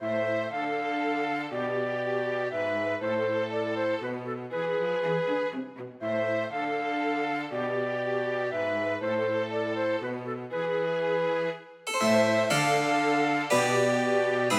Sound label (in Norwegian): Menuet for fløyte, fiolin, cello og cembalo